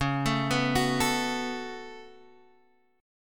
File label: Db 7th Suspended 4th Sharp 5th Db down Guitar Half Step Down